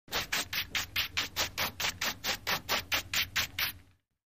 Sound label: Brushing Back And Forth With Light Bump